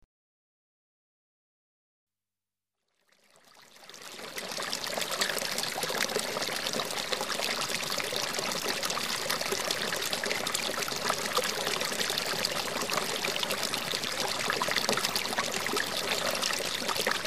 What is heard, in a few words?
small water stream